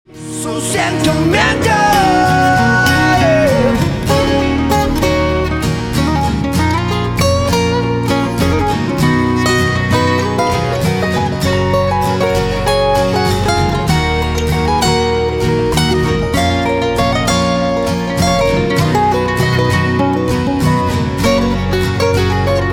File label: Solo